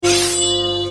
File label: sword